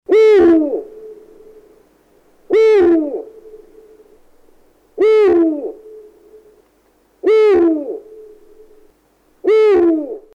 uhu

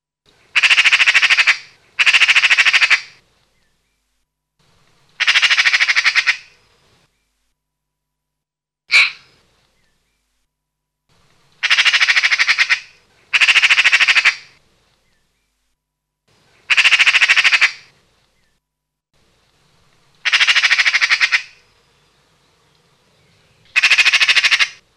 elster

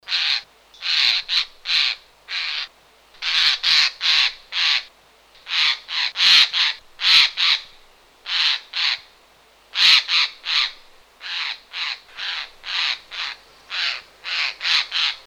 eichelhaeher

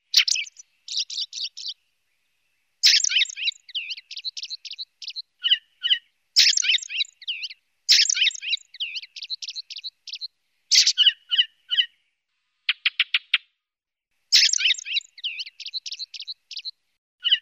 ringdrossel

